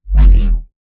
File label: Movement Jog; Titanfall 2